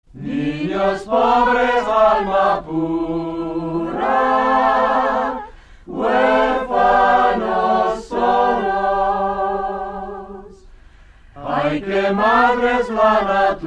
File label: Mexican Choir